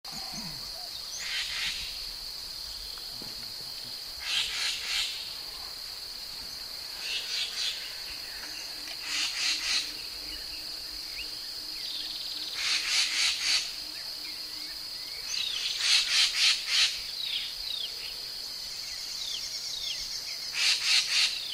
Canto da pega bronzeada

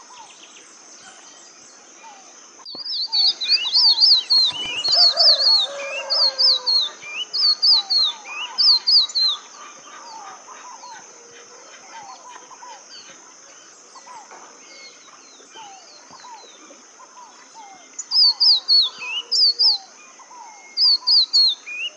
canto do balanca rabo de chapeu preto

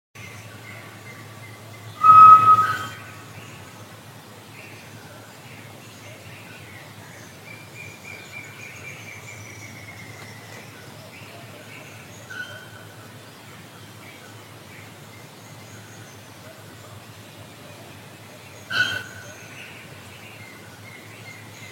canto do macuco

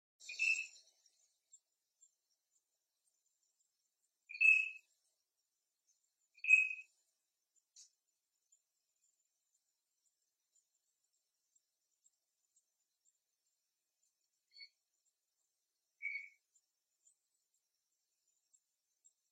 canto do gaio azul

